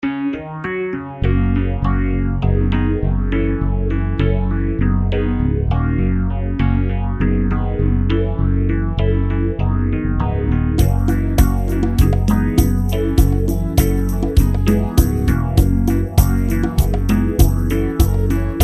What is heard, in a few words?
Phaser; PHASER on El. Piano